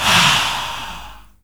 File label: WHISPER AH, Africa; Spectrasonics; Heart of Africa 1; WHISPERS